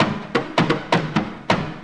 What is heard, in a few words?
drum 130 perc, drumloops